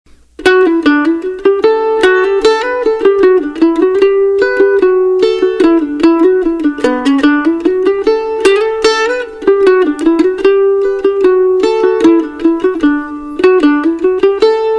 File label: 150bpm, mandolin